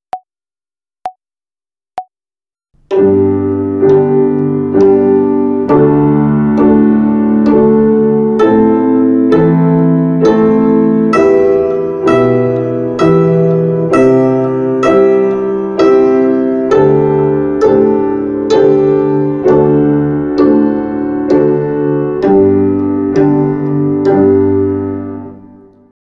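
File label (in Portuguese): Ginástica do Pizzicato com cordas soltas Ternária, Violino; Violino para os Pequeninos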